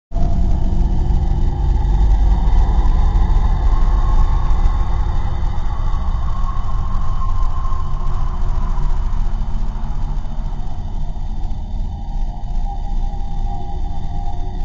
audio recording from a cemetery horror sound effects and creepy spooky sounds